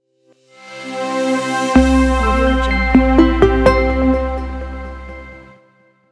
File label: short simple logo